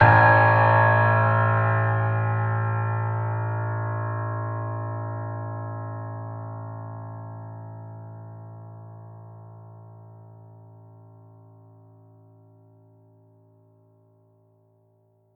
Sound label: piano theme modular hypothesis